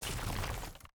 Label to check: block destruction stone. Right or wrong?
Right